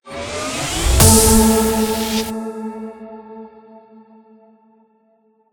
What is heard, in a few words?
Transition